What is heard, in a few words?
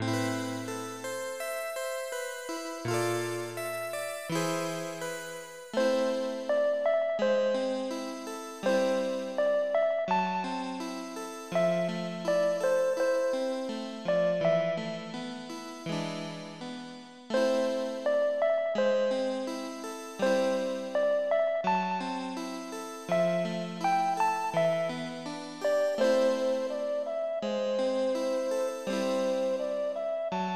harp